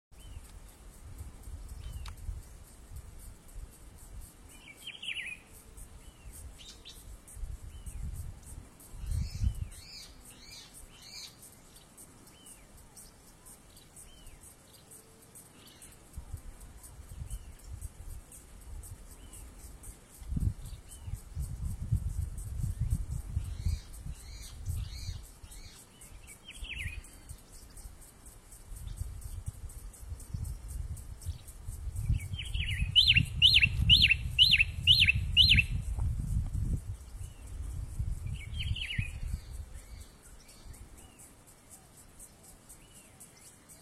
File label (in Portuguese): canto da garrinchao de bico grande